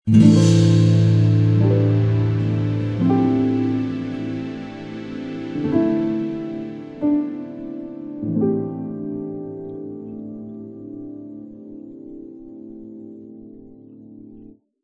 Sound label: Sand Surfing Chord